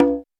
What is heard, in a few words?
Roland U220 Dry Set